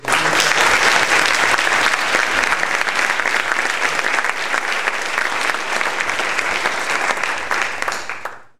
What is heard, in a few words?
Applause (short)